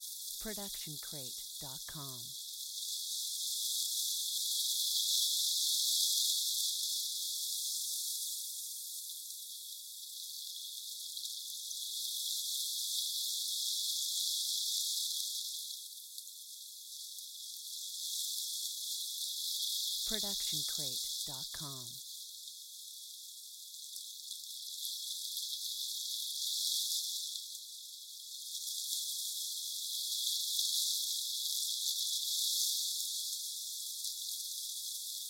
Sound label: Night Time Insect Swarm Noise; SoundsCrate